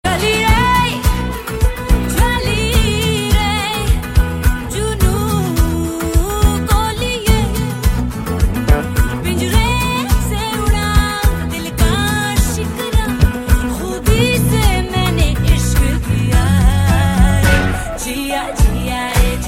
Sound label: Piano Tone